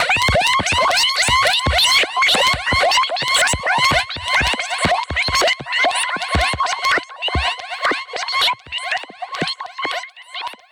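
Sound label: Modulation Proc, alarm siren samples, 120bpm; musicradar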